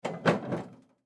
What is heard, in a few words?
Oven Vent Door, Oven Vent Door Open Antique; Appliance; Household; Blastwave